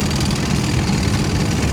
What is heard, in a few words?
Enemy Army Tank Move Loop